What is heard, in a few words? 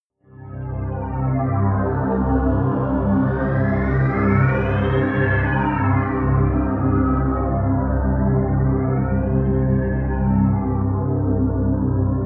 pad sweep turbine; flaechen